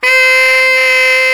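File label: SAX Tenor growl; Tenor V-sw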